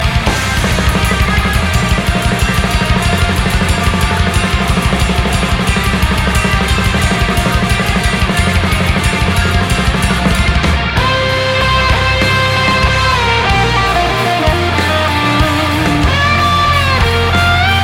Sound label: Solo